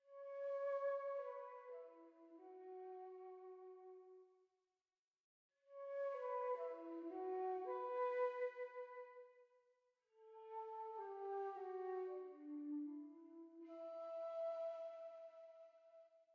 Night Flute; Sky Children of the Light gamerip

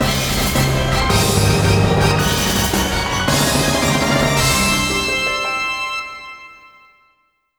Jingle Victory; Tottoko Hamtaro Nazo Nazo Q Kumo no Ue no Hatena Jou; NDS

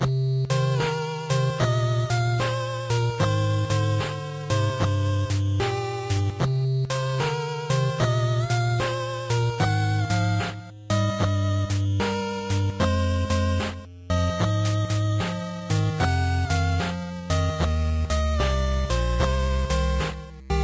Music Character Otter sad; save me mr. tako tasukete tako san switch windows gamerip 2018

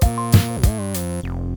concert intro; Plants vs. Zombies Battle for Neighborville; rush2 herbal assault plants zomburbia

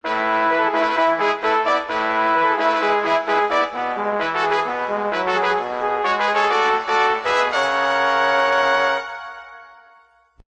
Fanfare; Monster Rancher 3; PS2

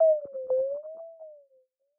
Checkpoint

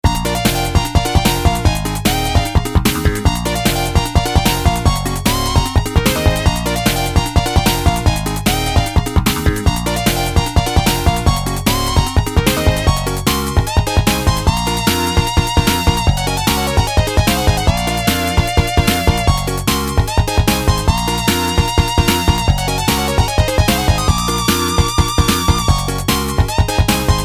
Bgm Bonus Wave; Cake Invaders; PS4; Xbox Series X|S

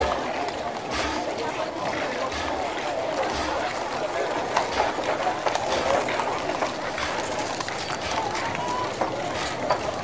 City Indust Small, Ambience; Civilization V

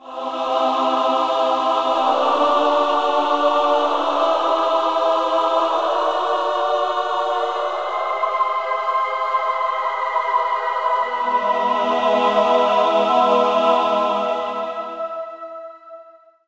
Forge Action; Diablo II

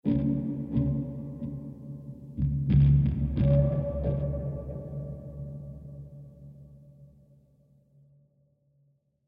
Lap Steel Rhythmic

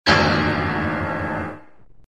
Item Dropped; Monster Hunter

